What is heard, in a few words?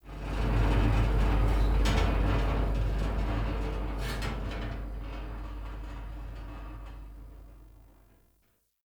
Exterior Tractor Metal Groan; Warframe; Duviri; Ambience